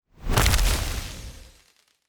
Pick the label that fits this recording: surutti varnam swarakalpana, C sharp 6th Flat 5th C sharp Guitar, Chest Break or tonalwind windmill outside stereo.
Chest Break